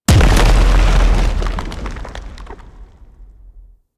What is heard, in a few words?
Golem Emerge; Warframe; GolemRemaster; Derelict